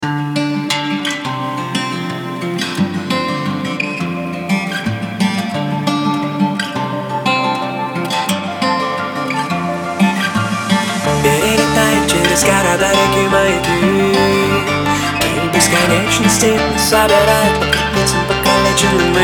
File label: bayan dnb remix